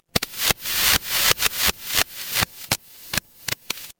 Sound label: fire electric spark